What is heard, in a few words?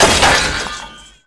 Chain Break; SFX Kit; Creaks, Scrapes, & Misc